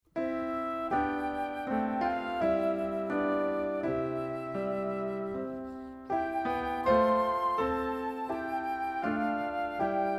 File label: words and music